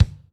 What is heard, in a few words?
kick, Acoustic